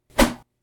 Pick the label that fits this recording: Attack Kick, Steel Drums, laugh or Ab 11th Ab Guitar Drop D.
Attack Kick